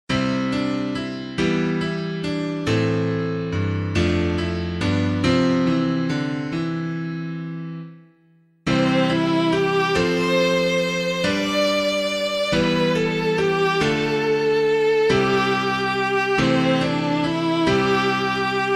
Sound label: piano, Hymns; Child in the Manger; Macbean; BUNESSAN